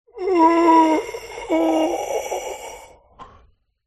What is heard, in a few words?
human breath last dying